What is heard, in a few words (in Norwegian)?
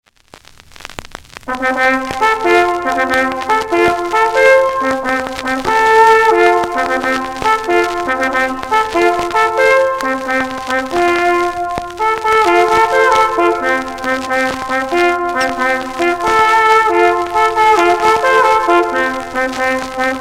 revelje, leirsignal